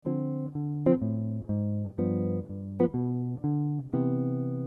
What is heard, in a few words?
bass